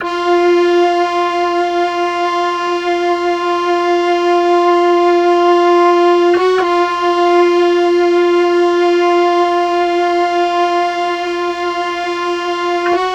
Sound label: Flute Concertina Combo; Sonic Foundry (Sony Creative Software); Cinematic Funk Urban Tone Poems; The Triad